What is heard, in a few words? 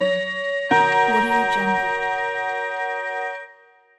clock chime